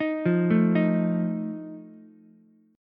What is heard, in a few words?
Eb Suspended 2nd Flat 5th Ukulele, Ebsus2b5 chord; Ebsus2b5 arpeggiated; JGuitar